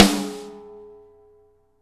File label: SN AMBIENT, Real Mega Drums